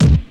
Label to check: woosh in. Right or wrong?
Wrong